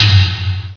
closed tom (G2); Star Trek JR Audio

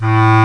Clarinet "bass" C2; Indiana Jones; iMuse Setups

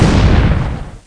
Enemy Take Damage; Defiance

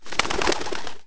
crow fly; Blade of Darkness

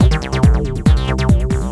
Club; Urban Chaos

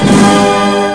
Concert Burst

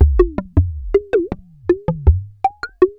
PERCUSSN, Ambient, Drum

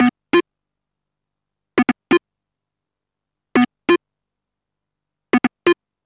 Synt; Evolution 070

